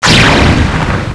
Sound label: shoot, deathray; Alien Arena